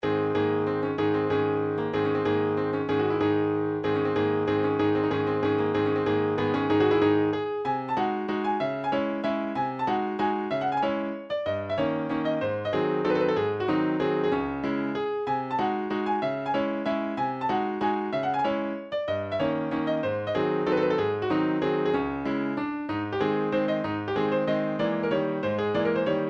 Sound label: mazurka, midi; skriabin